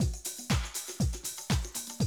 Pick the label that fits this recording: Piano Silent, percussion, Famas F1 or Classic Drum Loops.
Classic Drum Loops